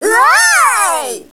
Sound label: whoaaa; DS; ML BOWSERS INSIDE; Fawful